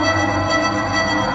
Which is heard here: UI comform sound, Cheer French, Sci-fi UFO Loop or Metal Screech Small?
Sci-fi UFO Loop